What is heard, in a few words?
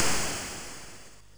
Mini Arcade Climax, MOS6581R3; sid